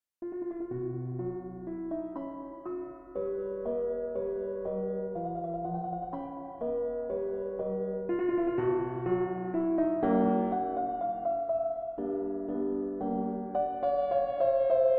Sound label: Quarter-tone Piano; Quarter-tone Piano Prelude No. 4